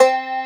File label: keys, 160 BPM, drum & bass; Ueberschall; Drum & Bass Flash Trackz